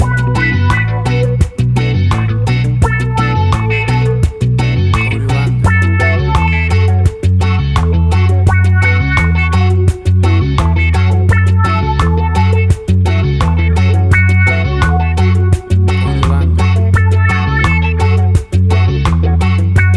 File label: Cool Guitar Reggae Rhythms